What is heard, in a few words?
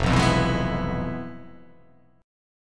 F Minor Major 7th Flat 5th